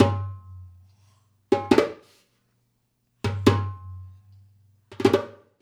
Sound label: Dumbek; Essential Percussion